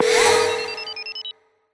Music Frontend

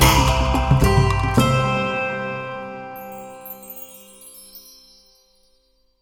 Combat loop win; Combat-loop-neu-win-B; curious expedition 2 macos ps4 switch windows xbox one xbox series xs gamerip 2021

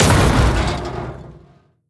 Enemy Army Tank Crushed; Rampage

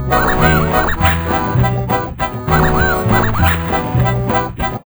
Ringtone; Smeshariki Museum of Communications

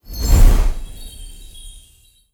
Magic Spell; Legend of Keepers (gamerip) (2019)

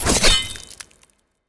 Soldier Strike; Legend of Keepers

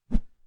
Small woosh; Frogsong

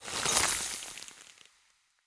Ice

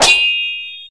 Swords Clashing; enemies

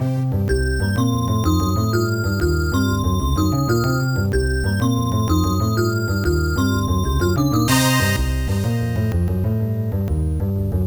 Funky; Sixth Sense Investigations; Amiga

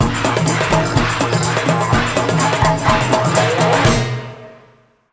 Jingle, You win!; rayman raving rabbids playstation 2

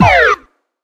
Pull It; SFX PullIt Remaster

